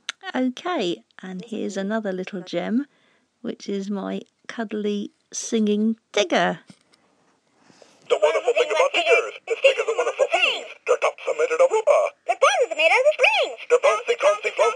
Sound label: singing